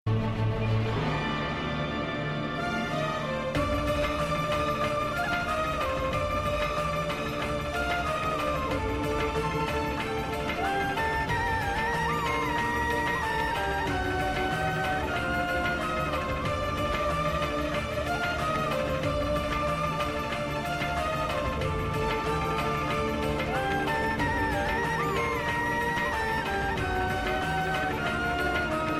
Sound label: instrumental bgm ringtone